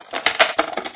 desacerrojar, puertas; ruidos

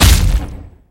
pelea

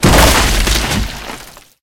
caida

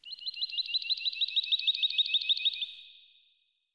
alondra, animales

